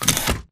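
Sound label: Stalker Movement Servo Head Retract; Titanfall 2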